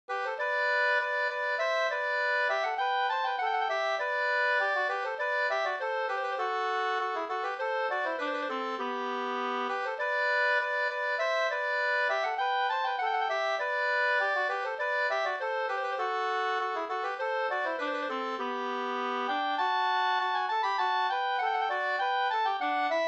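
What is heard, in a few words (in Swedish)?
snoa Gdur, two-part; versionen i G-dur med andrastämma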